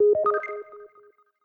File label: News, notifications; LG; G4